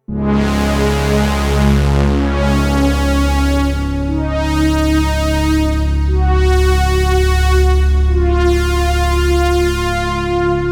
Korg minilogue xd

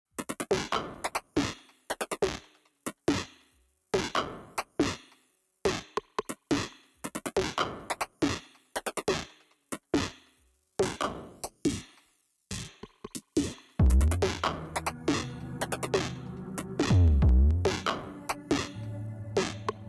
Beat

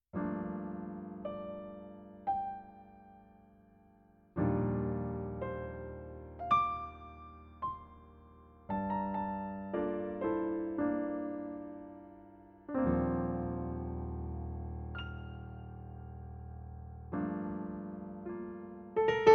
Italian Grand; A rainy Day in Provence

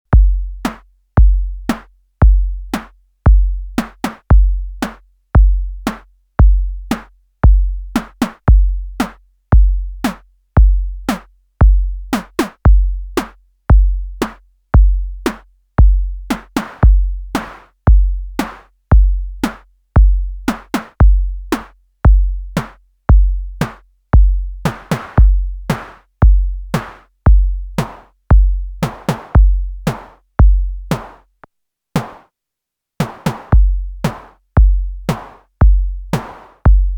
Snare Drum

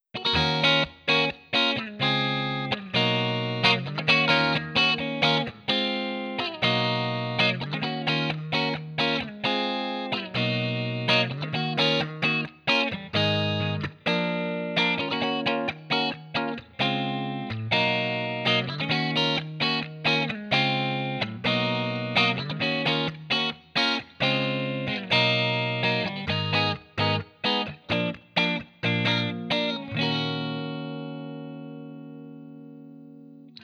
take me home clean; Gigboard

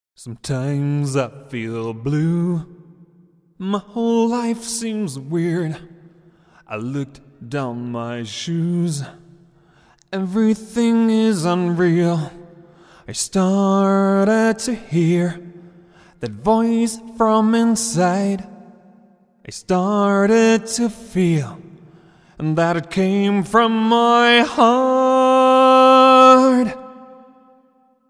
Vocals Concert Hall

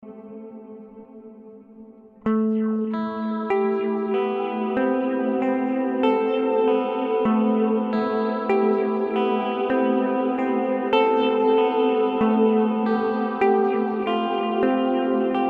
Boss DD 200 Tera Echo

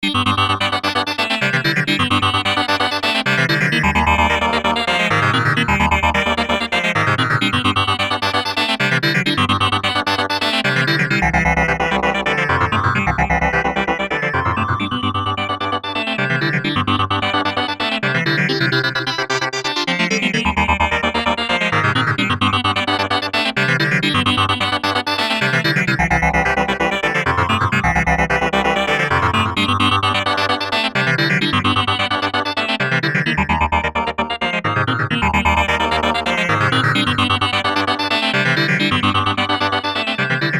Buchla Easel V Fast Sequence; Arturia

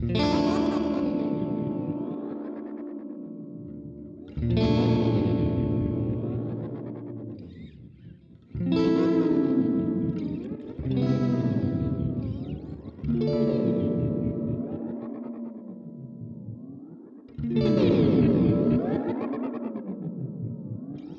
Red Panda Raster V2 Modulation Detune Sine

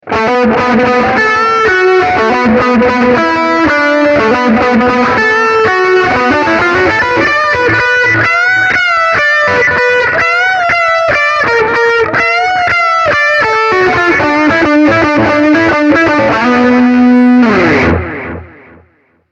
Cube-80X Classic Stack + Delay

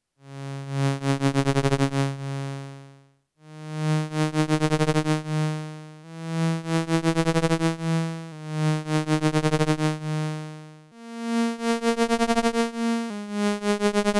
Moog Mother 32 Patch VCA Tremolo

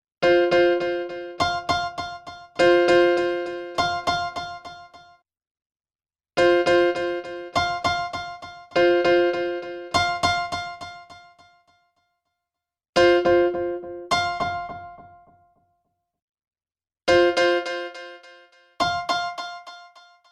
Nord Stage 3 Delay Analog Mode Filter

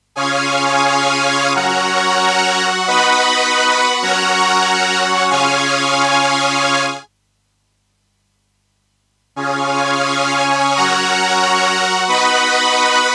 Behringer Solina Crescendo no med full

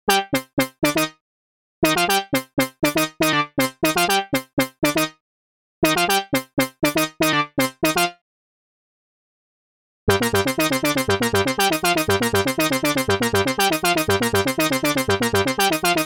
M12 Funky Lead 120bpm C

